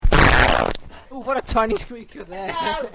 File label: squeak, farts